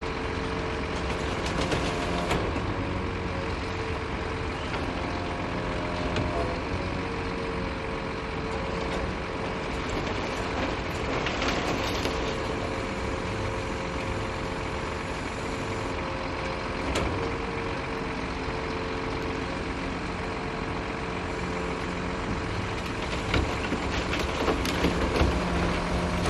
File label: moscow russia residential winter garbage truck loading and pulling away; lots of garbage